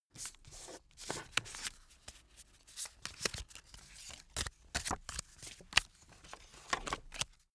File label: playing cards handle; comedy-cartoon; spielzeug